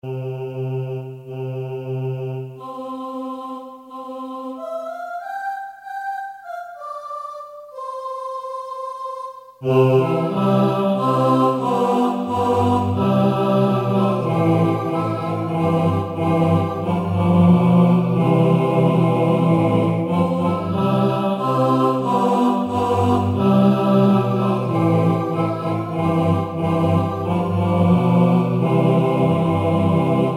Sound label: TENOR